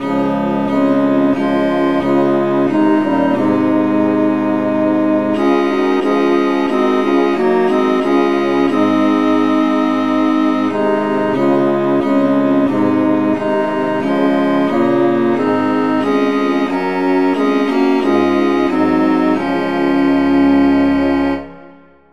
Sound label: traditional key des; SAINTTHOMAS